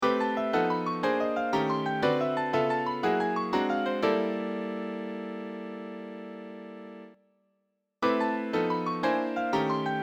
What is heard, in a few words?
a line in fourths ii-v pno